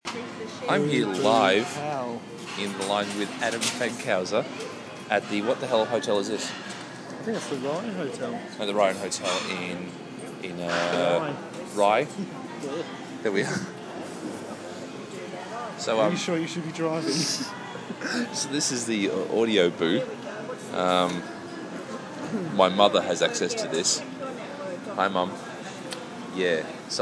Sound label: hotel babble; rye